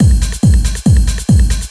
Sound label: hard beats 140, hard trance beats